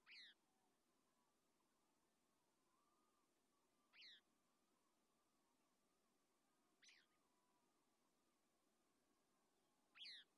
Gray-Cheeked Thrush Song With Distant Riparian Chorus; alaska acoustic highlights; BIRDS